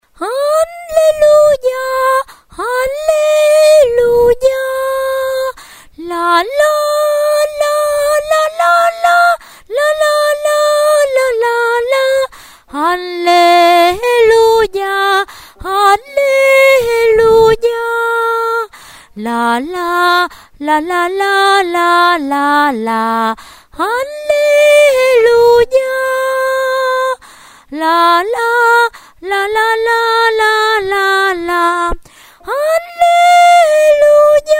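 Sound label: soprano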